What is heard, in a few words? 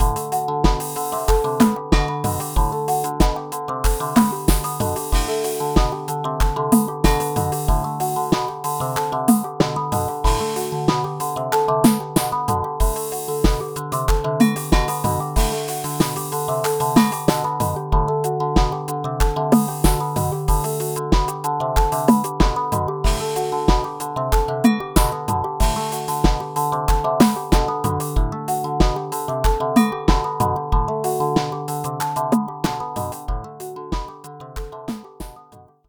guitar